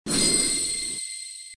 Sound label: Sfx Item Damage Up